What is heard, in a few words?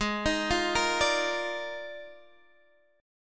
D sharp Major 7th Suspended 2nd Suspended 4th G sharp, chord